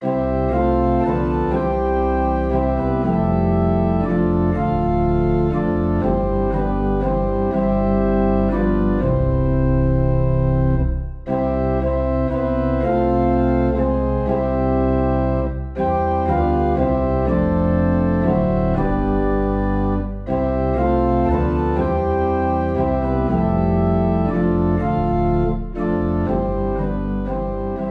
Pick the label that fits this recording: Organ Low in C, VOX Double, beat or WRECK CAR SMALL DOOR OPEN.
Organ Low in C